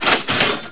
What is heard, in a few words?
cash; Tony Hawk's Project 8; XBOX